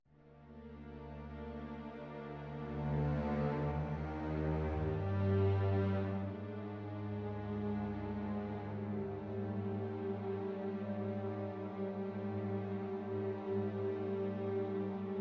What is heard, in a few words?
Jungle Rhythm Sneak String; Far Cry